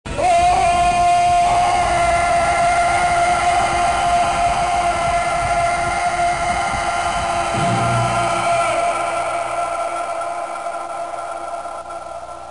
Predator seals its wound and roars into the jungle.; battle cry; Dutch invites the Predator with his battle cry.